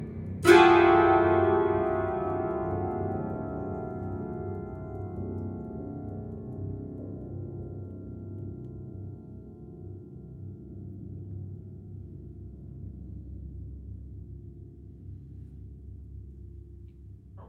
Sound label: Hi Sting